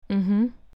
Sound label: Mmhmm